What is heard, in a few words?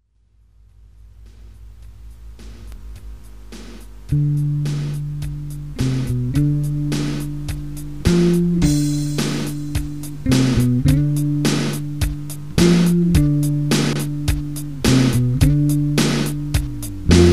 Funky